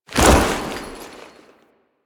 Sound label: Foley Melee Sweep; Warframe; Kahl